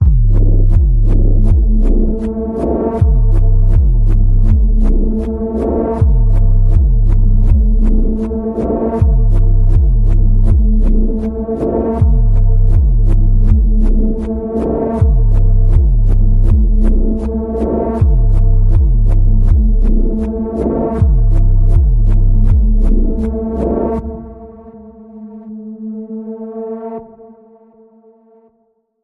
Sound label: Panning Pulse A, Key of A